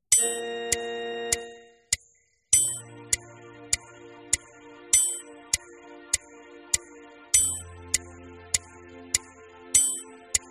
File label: warm up exercises; Playalongs zu den WARM-UP EXERCISES; otmars warm up exercises